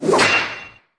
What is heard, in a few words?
Empty boxes populates with the won symbol; koi princess